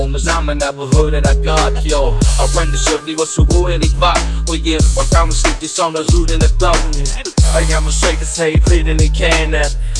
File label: west coast hip hop